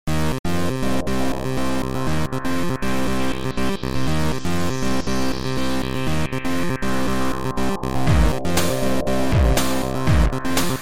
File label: 1BIT OVERSYNTH